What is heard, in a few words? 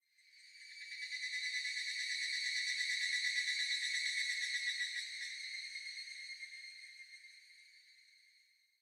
Ambience Cicada; Devour; The Inn